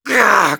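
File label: pain severe, German; Sniper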